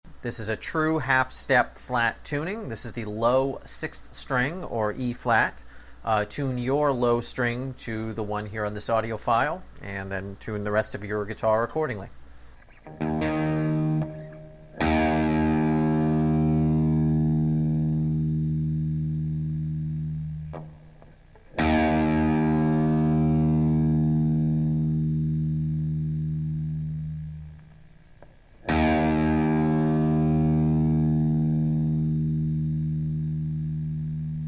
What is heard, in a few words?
true flat tuning, guitar